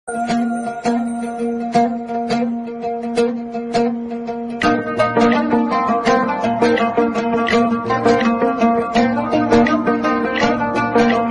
kashmiri ringtone instrumental ringtone kashmiri song ringtone